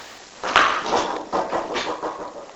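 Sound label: Bouncing Wooden ball off floor; School House in Michigan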